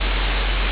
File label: ambience room tone